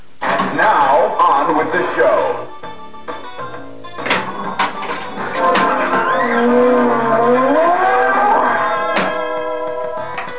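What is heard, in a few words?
Game Start, pinball; GAME START: Game Start / K.I.S.S. awarded; Creature; Here are some sounds I grabbed from Creature during play.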